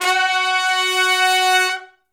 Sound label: Hi Medium Long Note (F#), horn section; Sections